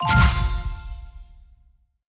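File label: free games multiplier; fg mutiplier; slots; sRoma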